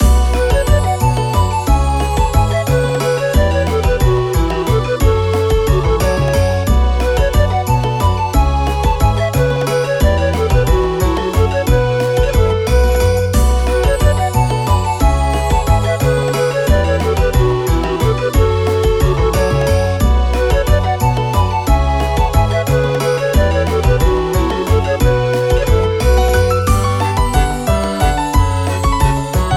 loop

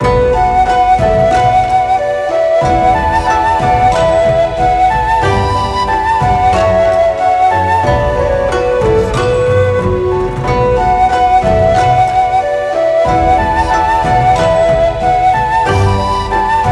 Otogi loop, BGM

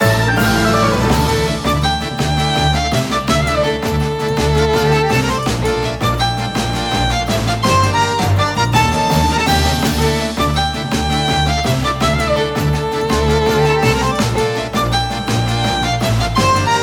Battle loop; RetroRoman

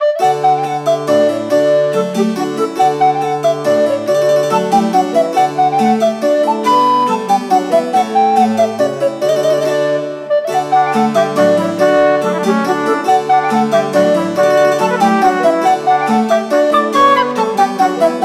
Teatime loop, BGM

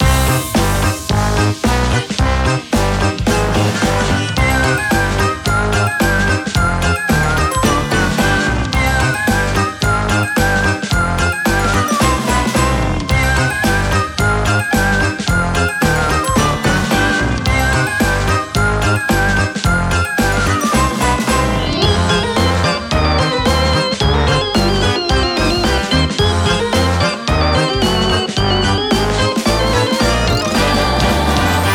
loop; ハロウィン

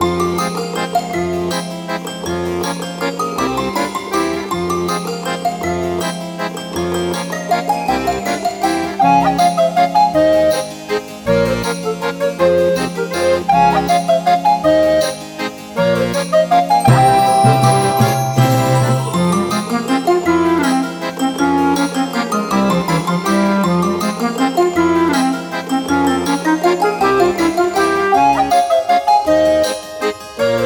Bewitched Forest loop, BGM

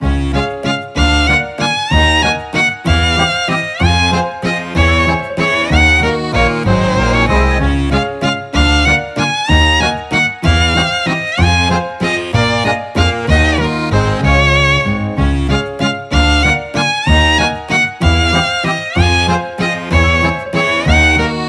Cafe Musette loop, BGM

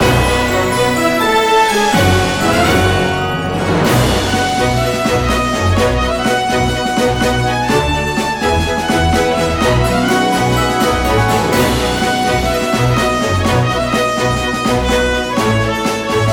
Zephyr Fields loop, BGM

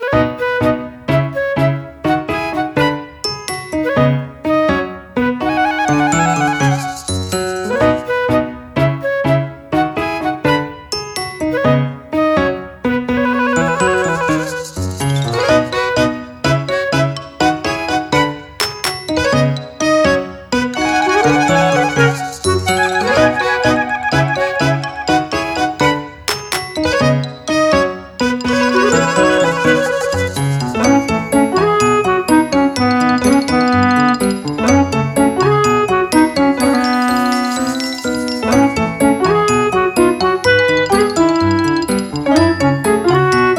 Gentle Brew loop, BGM

